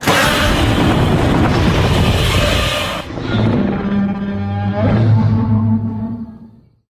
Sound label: Roar; Godzilla